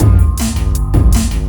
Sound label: DRUMLOOP D+B 160, Drum+Bass Loop; eJay